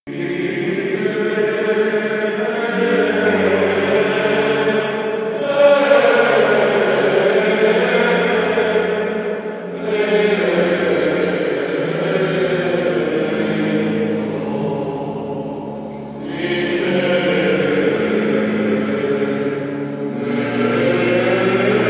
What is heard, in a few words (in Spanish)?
Canto De Perdón